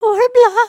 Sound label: beg